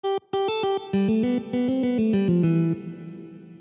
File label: major phrase